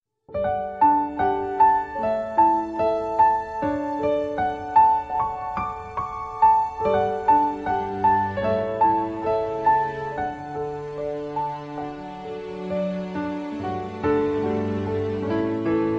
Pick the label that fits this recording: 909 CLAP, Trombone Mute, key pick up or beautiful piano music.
beautiful piano music